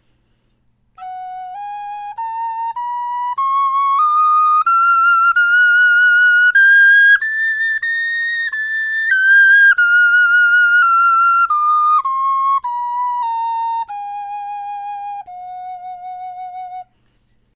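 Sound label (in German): tonleiter, Knochenflöten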